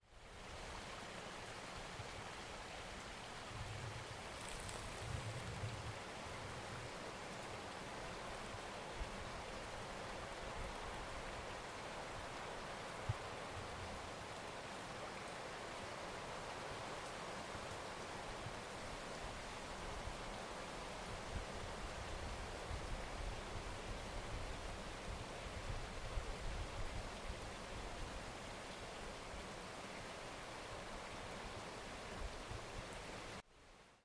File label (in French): pluie